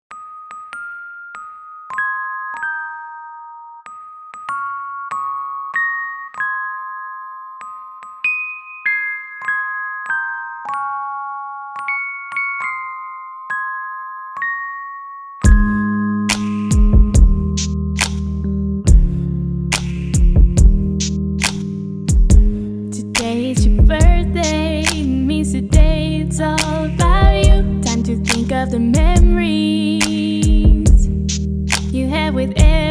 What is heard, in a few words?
Happy Birthday Song